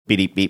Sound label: making short teletype sound